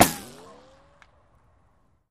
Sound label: Bullet Ricochet Sin